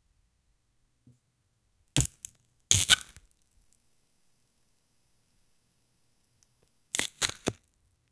long cutting of orange fr

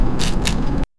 gentle rustle of leaves, plant movement

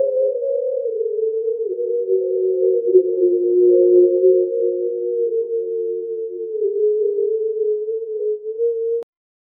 and otherwordly alien hum

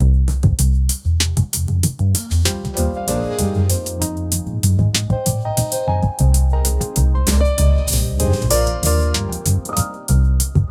chill funky groove with

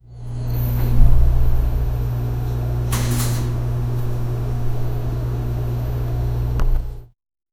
ambient diner sound

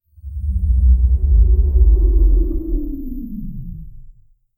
mythic item drop ethe; ethereal hum

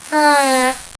flight information displ; display

